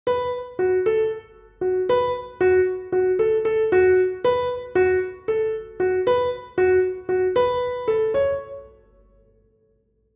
melody

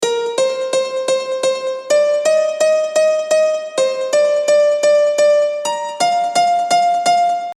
Arty melody

